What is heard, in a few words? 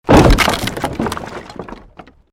created wooden barrier smash medium; Series of dramatic events based on wooden smashes and collapses; Smash Sound Effects